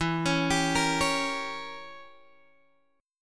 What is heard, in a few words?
E Diminished 7th E, Guitar Drop D